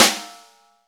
SNR, Snare; Club-50 - Foundations Roland; Ext.Snare 4

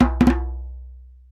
conga licks; Roland LCDP14 Africa VOL-2; BTS Conga Beats

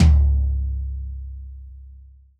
TD-20 Tom B, Roland TD-20 Toms

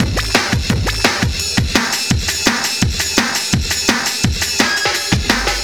DJ Beat, Drum N Bass

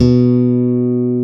Basses, Roland

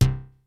SEQUENCE BASS; Kid Nepro; Elite

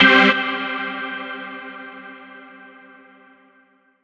Verb Stab; Big Fish Audio; OSH Chords

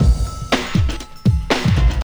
ding, breakcore Breaks

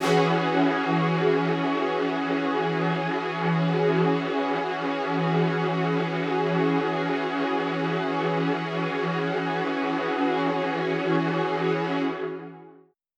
Poly String E6:9, synth orchestra

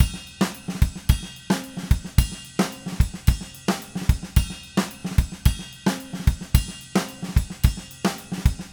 Red Maple Groove 110, DW Red Maple Kit

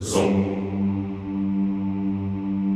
ZOOMMM G1, Classical Choir; Best Service; Extended Classical Choir

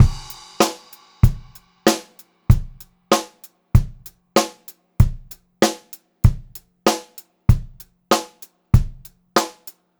96 CL VARI 6, Pop-Rock Drumloops; USB Soundscan vol.37; AKAI; 90 s Sample CDs

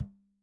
RACK TOM, ELECTRO KIT

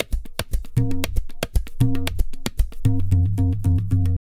Tabla, 115 BPM; Zero-G; Pure Tabla

